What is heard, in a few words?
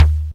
LowTom, Elka Drumstar-80